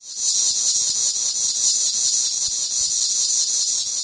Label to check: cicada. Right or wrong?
Right